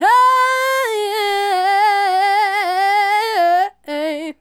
Female Solo, Hip-Hop and RnB Vocals

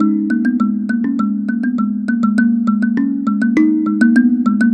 Mbira Low; Melodic; Ambient-Melodics-II

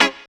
GTR; AMG; Now CD-ROM (Roland)

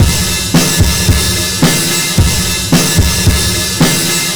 R&B Drums

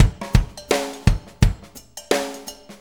Jim, Drum Tools, Loops; Sonic Foundry (Sony Creative Software); Loops & Samples Drum Tools

